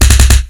middle punch; attack

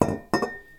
putting a bottle

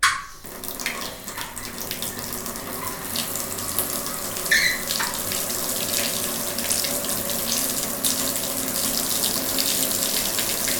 water in bath, 風呂にお湯入れる; 風呂にお湯入れる２; wetarea

